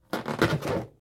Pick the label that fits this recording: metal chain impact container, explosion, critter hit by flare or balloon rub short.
balloon rub short